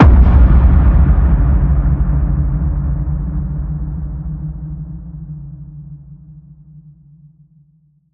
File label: Cannon Boom